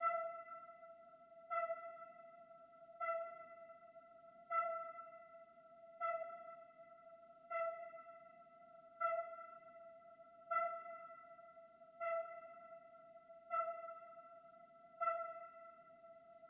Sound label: Suspense, 40bpm, Classic Horror Sci-Fi Music; Dead Ark